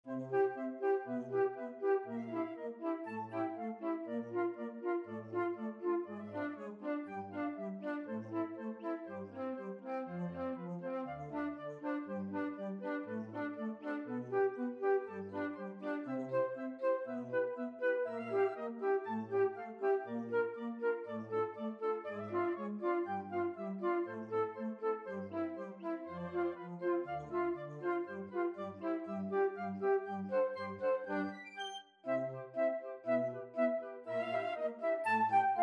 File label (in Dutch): fluit; Finale; Carnaval des Animaux; Camille Saint-Saëns